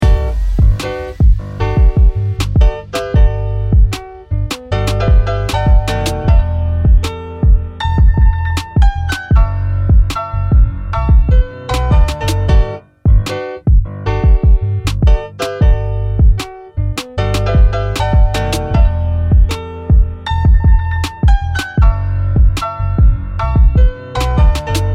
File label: Type beat